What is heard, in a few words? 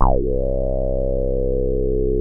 synt, Juno-2; Giga Samples Collection; Bass